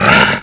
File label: pain; wanted